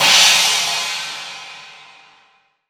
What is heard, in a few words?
Crash Cymbal, 20INCH CHINA